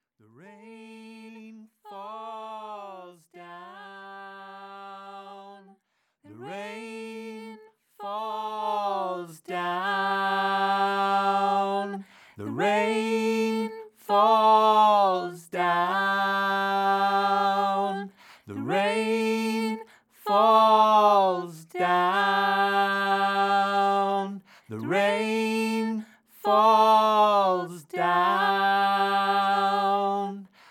rainfalls

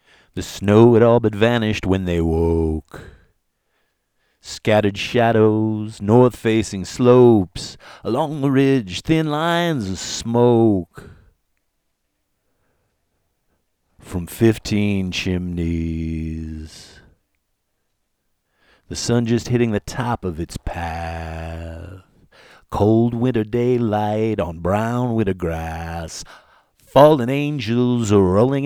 inst; dance remix

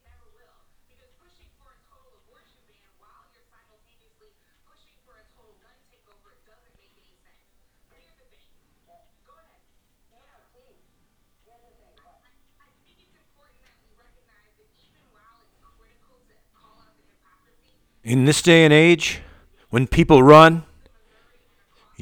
Box Talking; feast2024

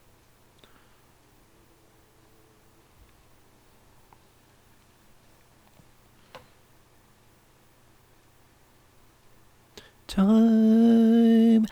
Classic Vocal

